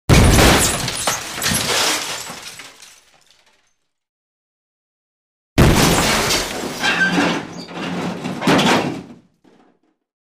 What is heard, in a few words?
Different explosions with shrapnel